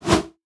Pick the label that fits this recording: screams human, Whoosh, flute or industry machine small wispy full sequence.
Whoosh